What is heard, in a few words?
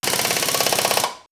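Laser Uzi, electronic fx; X-Static Goldmine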